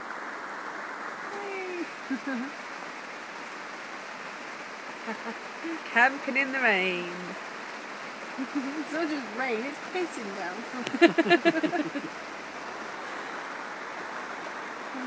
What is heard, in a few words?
camping